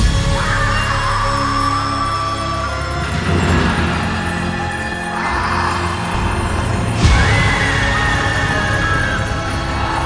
big Win Loop; halloween jack

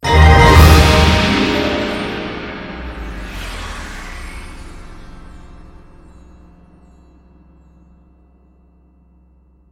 big Win End; fairymirror

